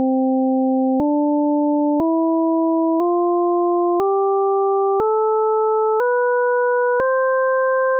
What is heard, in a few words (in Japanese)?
純正律のドレミ; 実際に平均律と純正律の音の違いを体験してみましょう。; classical music